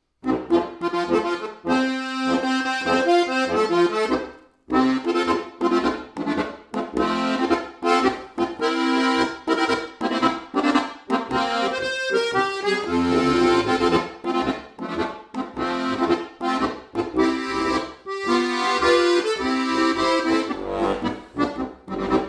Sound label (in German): marsch